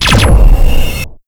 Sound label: laser; weapons